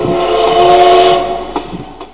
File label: train